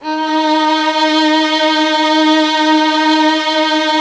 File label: TRON 3 VIOS D#2; E-MU Formula 4000 Series Vol. 5; Protozoa